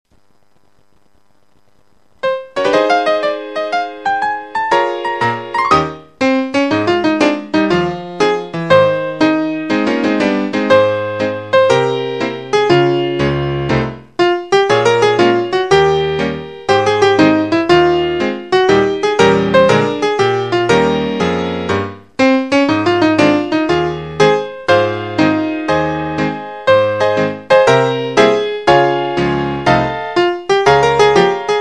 Christmas Piano

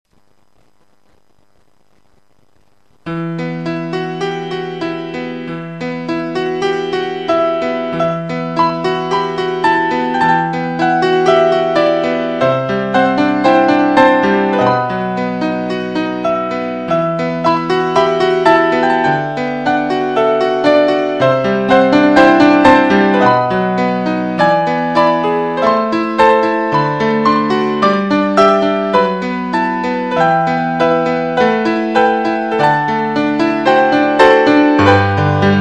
Christmas Piano God Rest Ye Merry Gentlemen

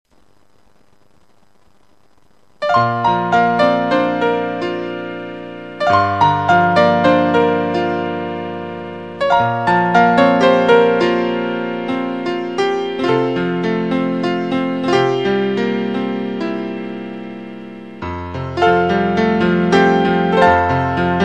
Christmas Piano

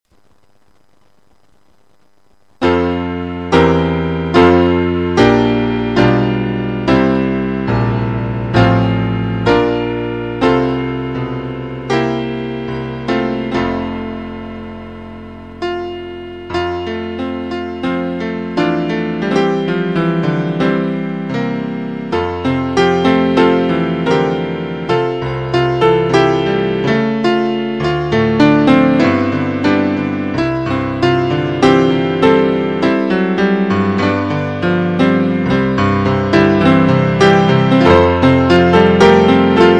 Christmas Piano O Come All Ye Faithful

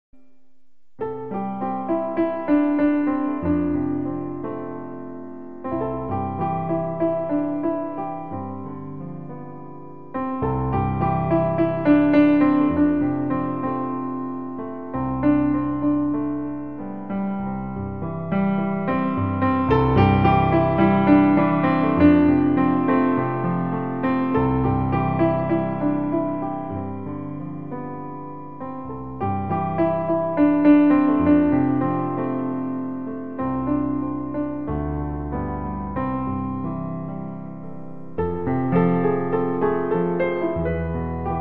Piano

